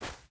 footstep burlap snow run; Rust

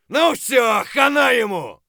fight; Escape from Tarkov

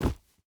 footstep hide dirt jump land; Rust

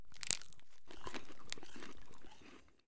eat; slippers; Escape from Tarkov

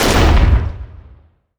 Hit Med In, Damage; DCS

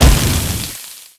burst beam hit

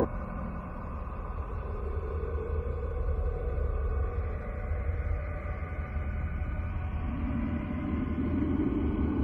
ambient battle; World Of Warships

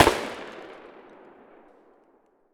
saiga outdoor far; Escape from Tarkov

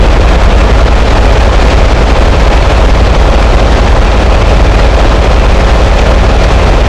Vehicle Engines; Red Orchestra 41-45

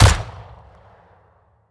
sawnoff shotgun attack silenced; Rust

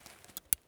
food can draw; Escape from Tarkov

